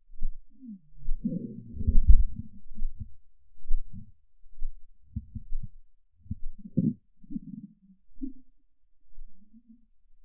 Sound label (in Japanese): グル音 正常